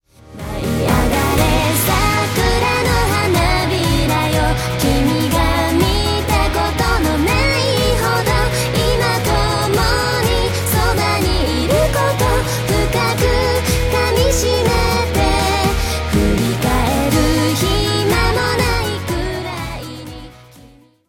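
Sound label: background music crossfade; Invaxion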